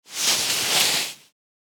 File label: Nylon rustle movement